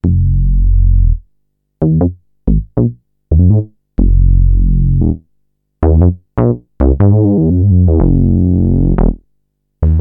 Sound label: Phatty bass